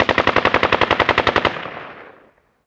gun ak47 med; amb elements; modern warfare 2

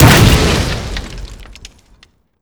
explosion door wood breach; modern warfare 2

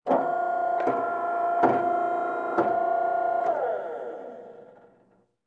ac130 hydraulics; modern warfare 2; amb elements

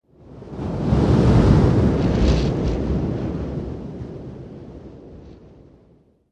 wind buffet; amb elements; modern warfare 2

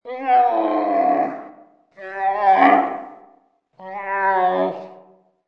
camel; amb elements; modern warfare 2

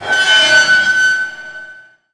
metal; modern warfare 2; amb elements

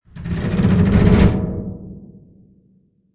ac130 metal stress; amb elements; modern warfare 2

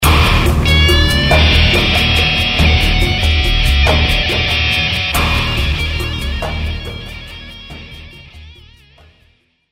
spawn usa, music; iw4x; iw3

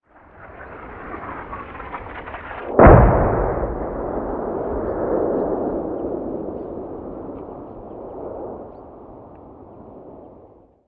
artillery med; amb elements; modern warfare 2

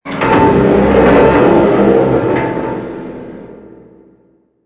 metal; modern warfare 2; amb elements

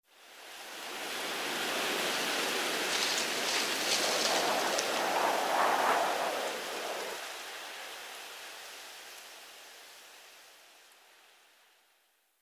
wind leafy whistle; amb elements; modern warfare 2

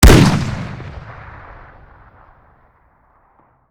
fire; black ops 6; iw9; weap india fire npc

